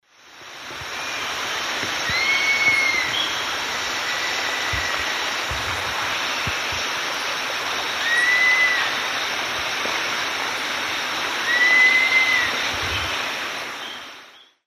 Porphyrolaema porphyrolaema, song; S. America; Eastern Lowlands

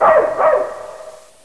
DOG

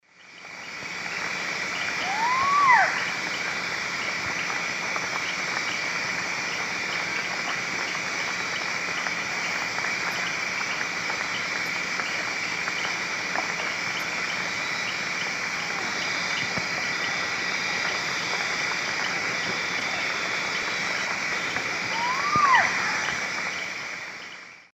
Ciccaba huhula, call of female; S. America; Eastern Lowlands

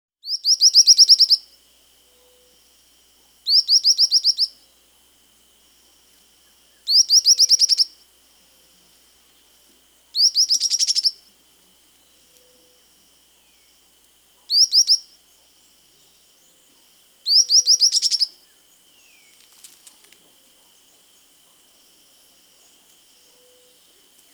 White-lored Tyrannulet (Ornithion in, S. America

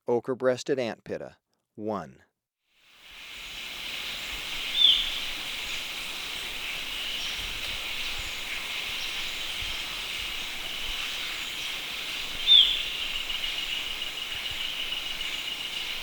Ochre-breasted Antpitta, Birds of Ecuador; S. America

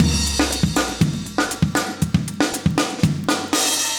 120, loops; Sound Effect Library

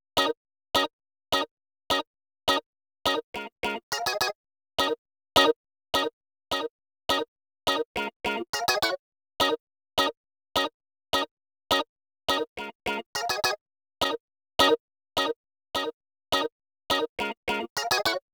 Guitar, RnB

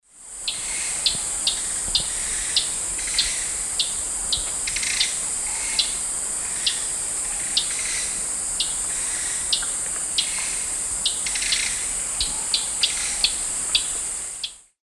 Basileuterus fulvicauda, call; S. America; Eastern Lowlands

